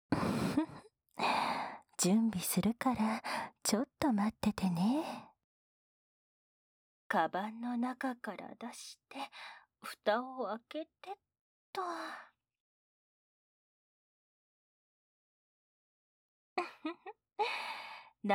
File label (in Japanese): お耳のオイルマッサージ SE無し, ASMR